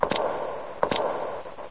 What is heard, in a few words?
katukatu; MapleShrine